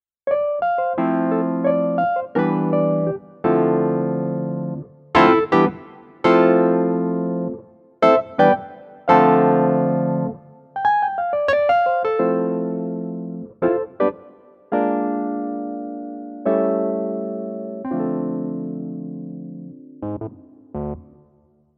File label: Yamaha YC88 E Piano